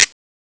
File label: GUIRO; CMI